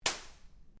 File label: Whip Crack Belt Strap; Foley sounds for melee weapons; Objects